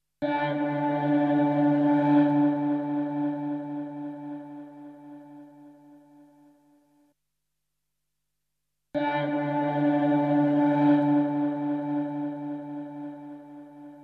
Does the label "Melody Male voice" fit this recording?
No